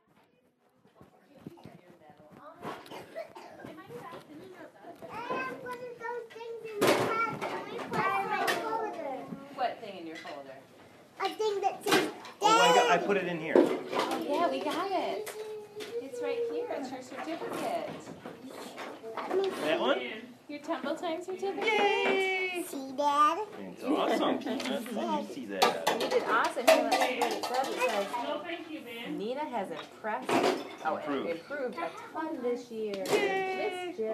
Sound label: kid Ambiance